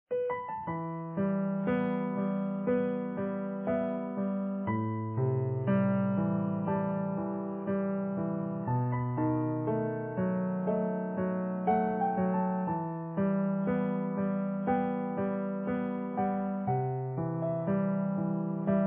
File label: Easy Piano